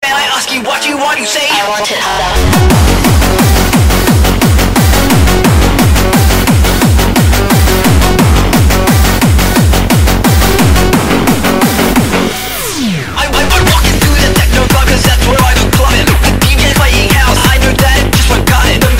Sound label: Hardbass